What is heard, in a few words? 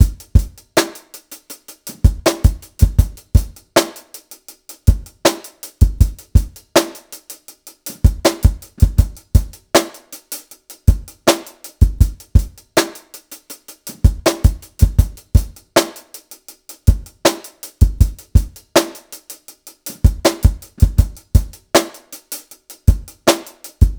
Drum Kit, Electro Lounge; Sonic Foundry (Sony Creative Software)